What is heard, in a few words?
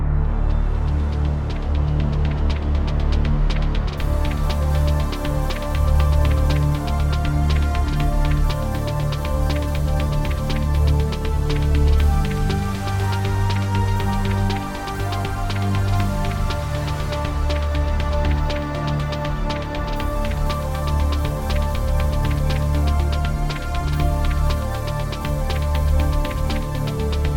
Sci Fi Open Looping